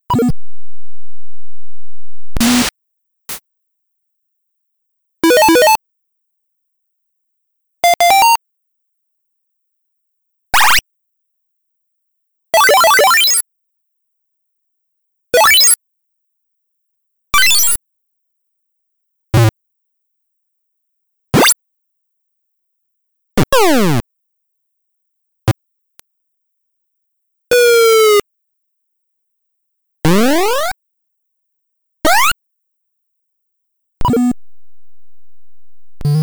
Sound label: Sound Effects; Super Pitfall; NES